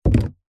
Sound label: industrial power steering fluid in plastic bottle set down garage floor